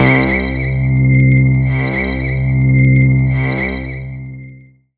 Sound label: Dark n Screechy, Pads; Zero-G Jungle Warfare 1, 2 & 3; 90 s Sample CDs